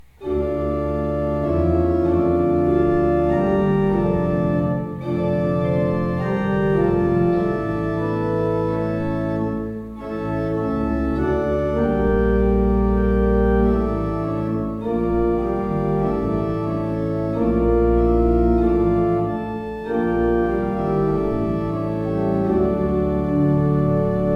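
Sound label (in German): Kleuker 15 II P, pfeifenorgeln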